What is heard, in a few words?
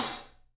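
SABIAN HAT, left; 3D Sound; 3D Drums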